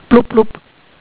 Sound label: BLUB BLUB